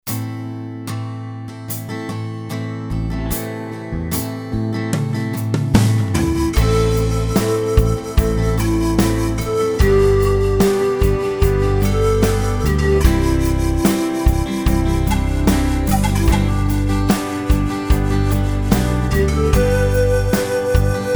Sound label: DARK ISLAND, accordion